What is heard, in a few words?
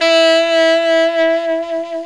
E3 tenor sax